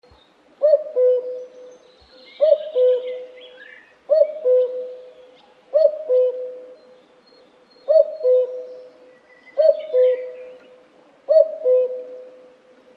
Cuckoo Call; music

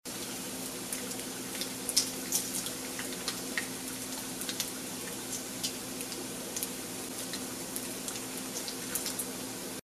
Frying Bacon

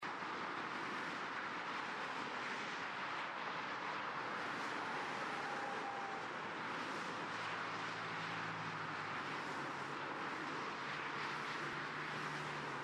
Highway distant; A Description of Sound and Its Qualities

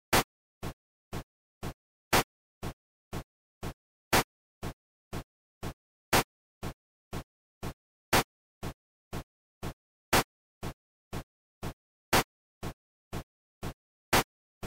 Four-beat; music; rhythm

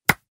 HandClap; pressure variations

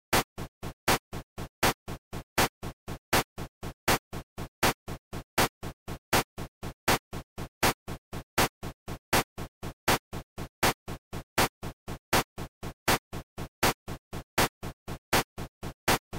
Three beat fast 2x, rhythm; music

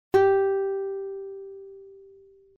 Plucked string fifth g4; music

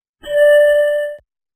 PanPipe fifth 3-2; music

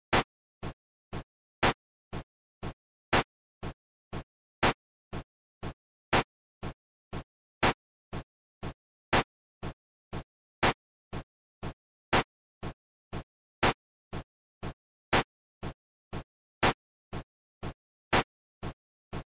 Three beat; music; rhythm

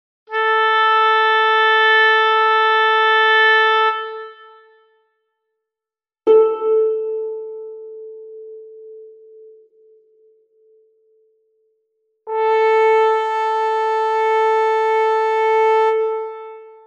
Timbre samples; A Description of Sound and Its Qualities

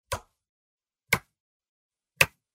Hand Claps; pressure variations

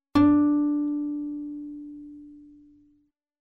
Plucked string second d4; music

